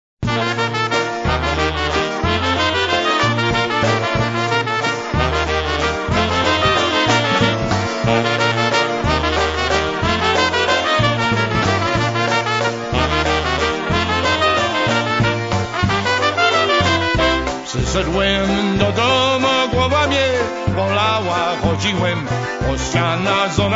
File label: waltz